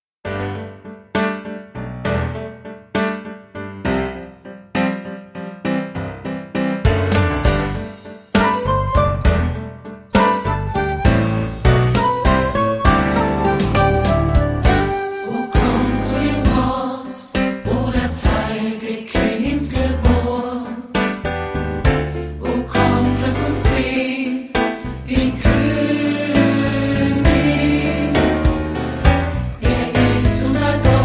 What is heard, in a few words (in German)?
Lasst uns anbeten den Herrn, Childrens Corner Studio Chor